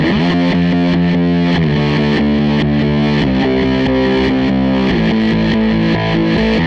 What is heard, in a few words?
Les Guitar Loop